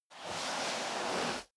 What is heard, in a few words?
step boots sand slide long